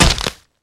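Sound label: crowbar hit body; weapons; tf2; hl1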